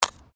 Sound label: Digging Small Handheld Tool Rocks